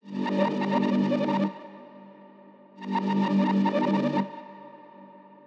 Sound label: ATMOSPHERE 175; KULTURE